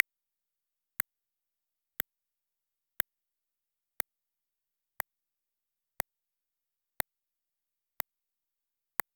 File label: create a modern casual ui, click